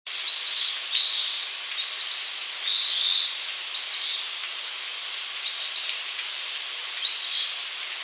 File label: phoebe eastern; birdsongs